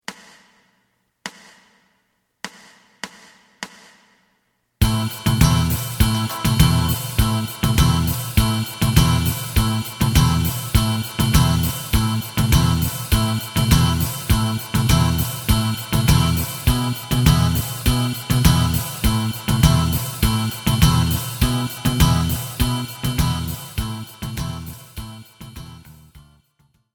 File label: lead